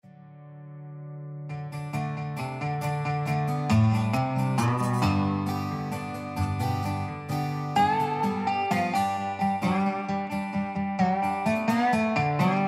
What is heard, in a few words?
DOBRO EXPRESSION